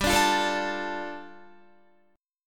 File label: G 7th Suspended 2nd, Guitar; G7sus2 strummed